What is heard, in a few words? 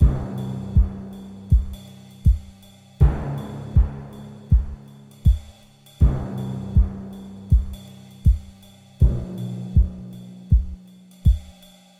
C Drums Perc, 80